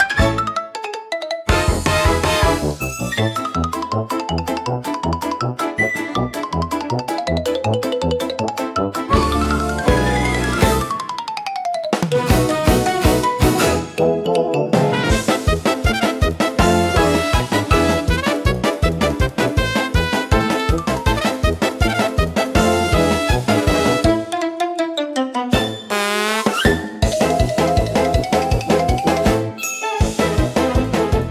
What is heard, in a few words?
Tuba Tumble Parade, comical orchestra for slapstick gag scene